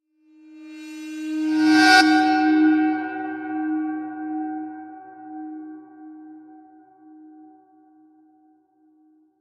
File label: Metallic Thunder; Immediate Music; Decompression, Vol. 1